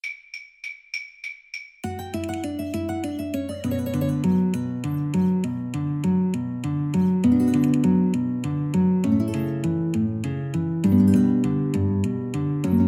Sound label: spanish violin